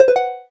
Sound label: gayageum, keytone